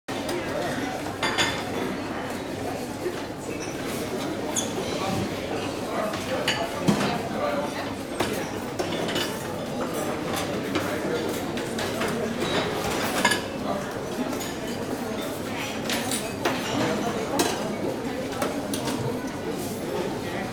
cantina, surroundings; best service Blue Box

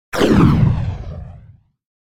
Weapon Sci-Fi Firing; Hollywood Edge; sound designer's toolkit v2

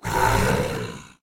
Ice Buffalo Hit; Blind Quest - The Frost Demon

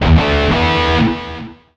Pickup Guitar Riff; SFX Kit; Pickups

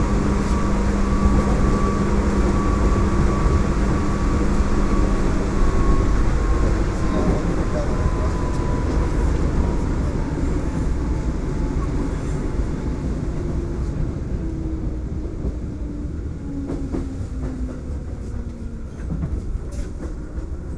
Urban train ride; urban and suburban; boom library

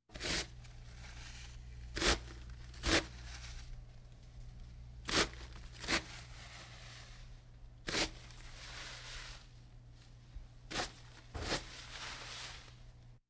Hospital effects

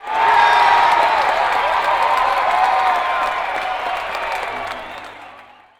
BMX crowd cheer small; Crowds

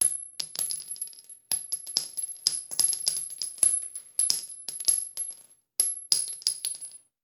Bullet Shell; Sound Ideas; Guns

